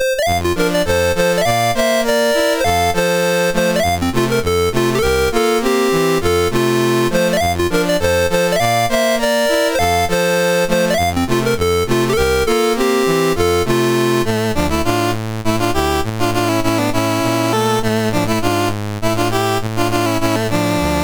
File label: Hungarian dance in F, mus MOS6581R3